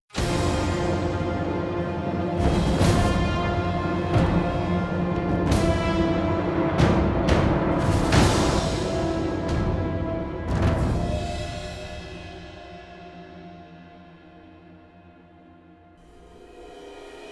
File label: Tibet Portal